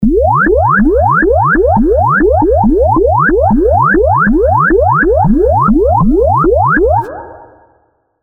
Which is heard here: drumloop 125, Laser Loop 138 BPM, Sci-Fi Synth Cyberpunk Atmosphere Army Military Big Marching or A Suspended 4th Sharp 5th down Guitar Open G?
Laser Loop 138 BPM